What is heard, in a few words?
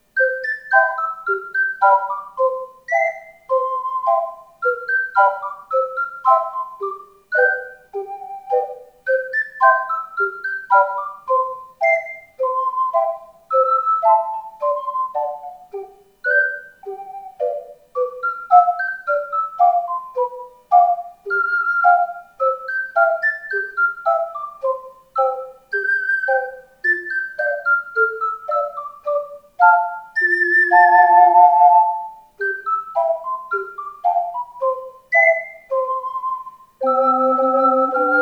gavotte, ocarina